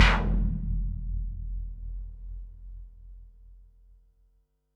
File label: tom, Pearl SC-40 Toms